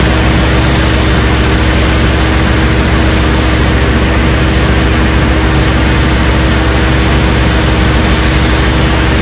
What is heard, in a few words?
jet side, engines; War Thunder